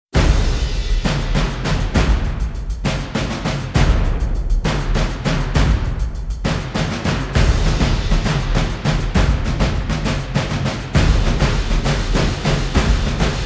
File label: drums build